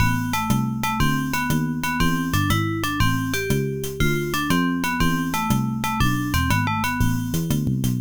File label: Jazz; AdLib music synthesizer card demo songs; IBM PC XT AT